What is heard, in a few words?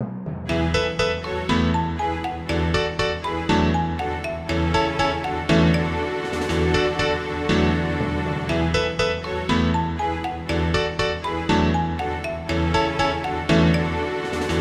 Drama White Car, midi